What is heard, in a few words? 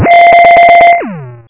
Buzzer; Mr. Moo's Math